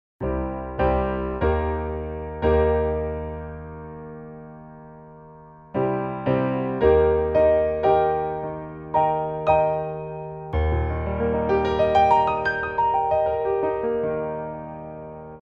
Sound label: Equal Chords